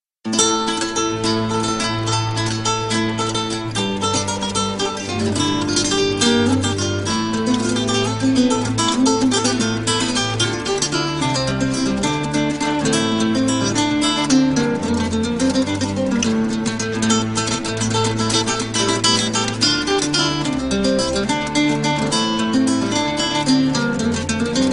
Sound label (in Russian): tradicionnaya cherkesskaya muzika